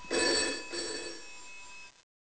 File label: ring signal; Gadu-Gadu 7.6